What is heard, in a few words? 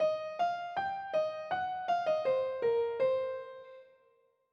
blues scale